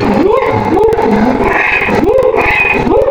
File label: Zebra, Animals Wavetables; Cymatics; Serum; Animals for Serum - Gold Edition